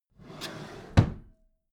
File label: empty drawer smooth close regular